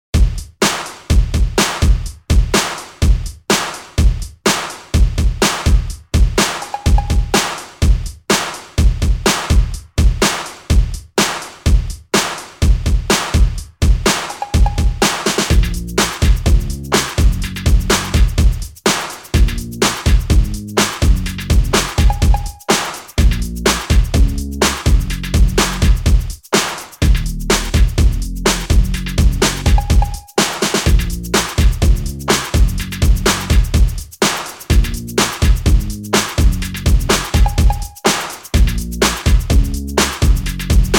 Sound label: beats loop track